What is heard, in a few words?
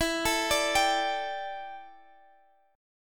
D Suspended 4th E, guitar; Dsus4/E; arpeggiated